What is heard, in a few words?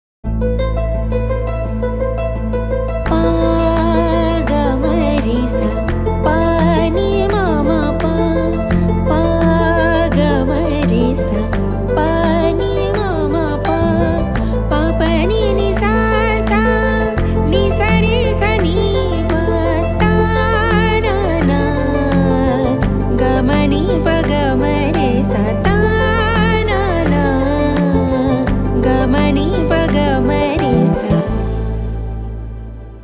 Ringtone